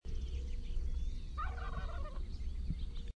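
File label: Wild Turkey gobble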